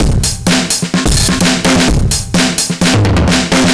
Slack Drums A, 128bpm, Drums n Perc, analogue circuit; musicradar